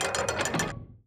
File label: open door